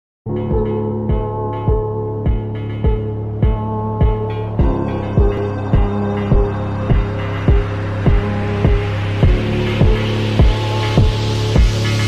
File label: sad emotional ringtone; sad boy